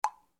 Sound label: message sound